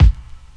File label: kick